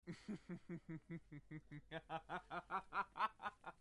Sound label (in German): lachen